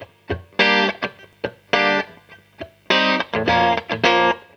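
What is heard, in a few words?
guitar, Roots Funk; USB Soundscan vol.41; AKAI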